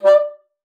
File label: bassoon, D5; Xsample VOL-6